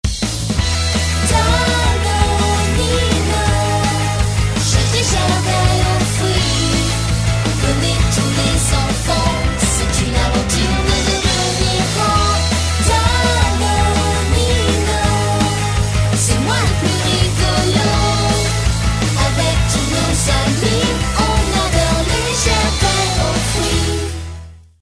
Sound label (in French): Pub chantée; Danonino